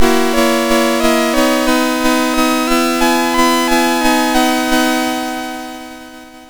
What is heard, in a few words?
sid MOS6581R2; Plaque Man